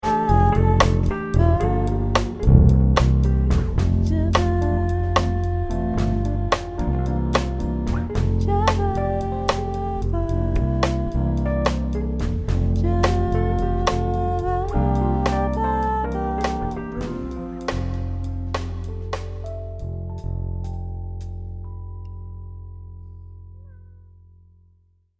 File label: bossa